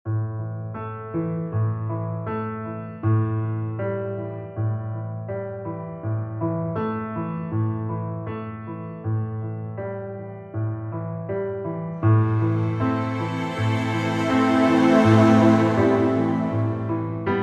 betrayed 80bpm

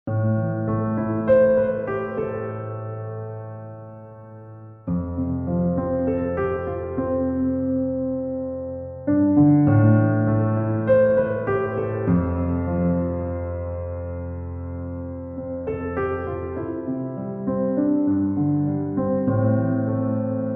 100bpm, Underscore; hartwigmedia

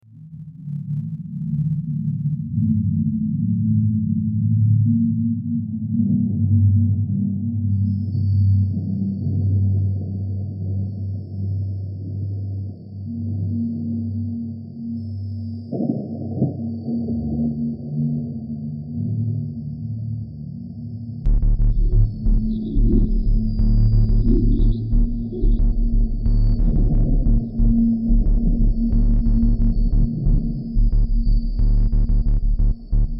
shapes of darkness 90bpm, Underscore; hartwigmedia

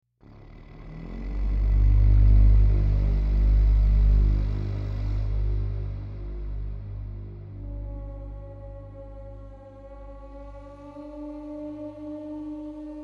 dark gods 100bpm

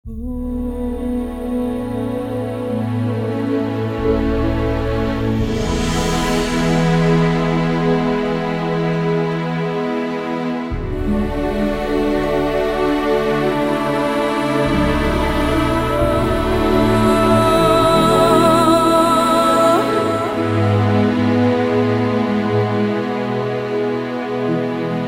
epic intro 90bpm base E sharp; hartwigmedia

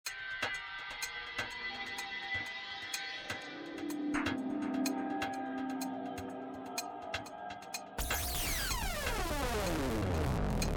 125bpm, electro rock; hartwigmedia

